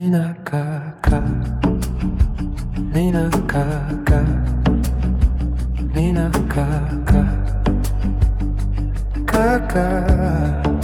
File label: nina acoustic